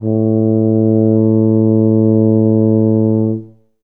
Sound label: tub A2, Tuba; Best Service ProSamples vol.51; Classic Orchestra 2